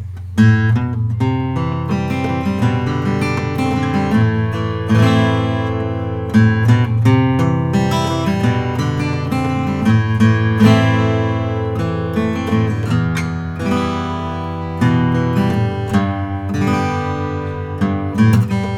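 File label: Collings 02H German spruce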